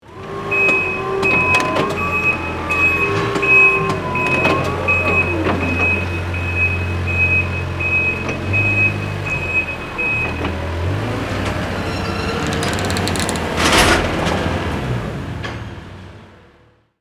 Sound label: Forklift reverse and cargo drop; construction vehicles